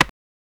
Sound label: computer board game menu